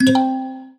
PUZZLE Success Xylophone Three Note Wet; stereo; Universal Sound FX

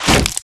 Melee Physical Medium; Kilta

